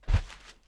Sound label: step; lirili larila